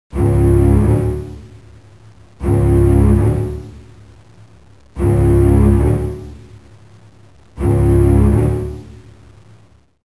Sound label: strings; jaws